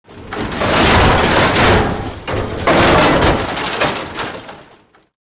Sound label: metal crash